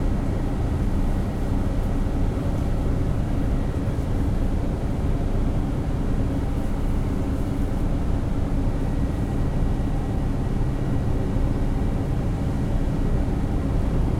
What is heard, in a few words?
background room interior hum loop; sonniss gdc